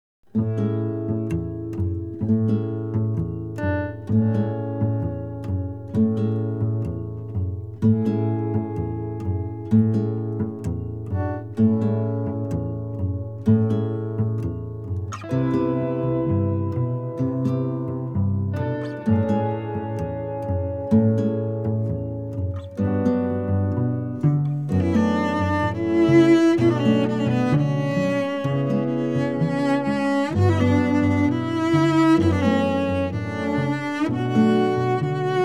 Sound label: painting guitar